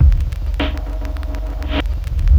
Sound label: drbreak, drumbreaks; drumloops; Masterbits; Vinyl Attack